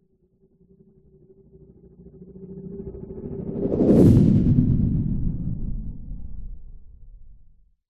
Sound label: science fiction spacecraft fly by fast